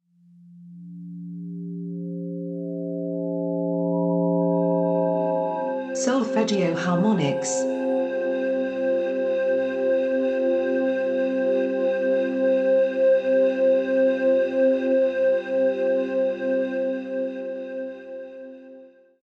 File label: Solfeggio Harmonics